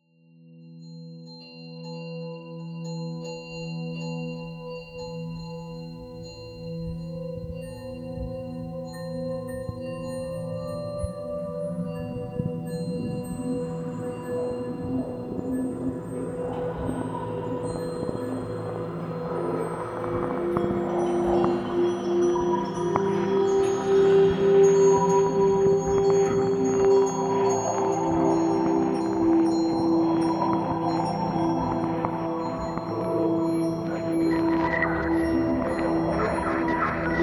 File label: Ambient Space; Minora-Game; Sound-Artlist